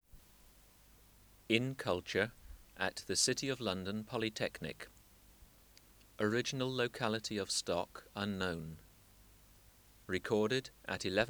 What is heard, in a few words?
Teleogryllus oceanicus